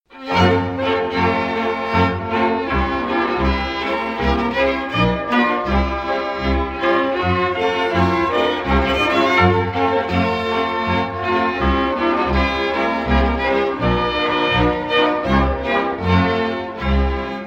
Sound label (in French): Republique tcheque; Zatacany